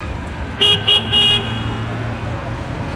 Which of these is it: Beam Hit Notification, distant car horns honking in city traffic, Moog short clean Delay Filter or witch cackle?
distant car horns honking in city traffic